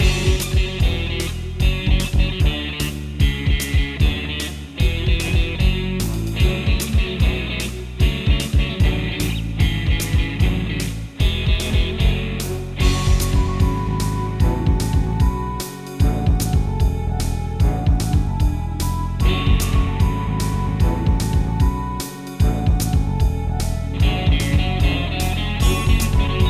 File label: Pirate; jett tailfin; wii u